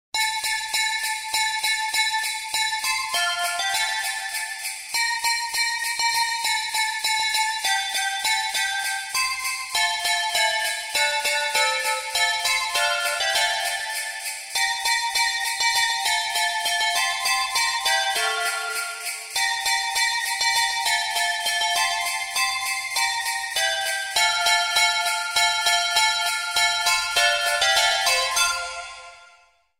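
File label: Jingle Bells (Solo Bells), Christmas Themes; Focus Music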